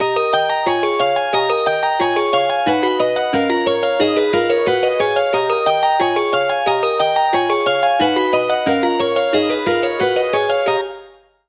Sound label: Lullabye